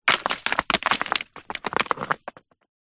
foley rock small fall roll down large pile of others; zapsplat